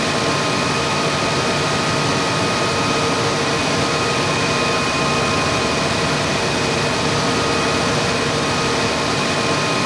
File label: Aircrafts Engines Mi8 Reductor; DCS